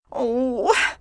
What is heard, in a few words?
oohx; hl2dm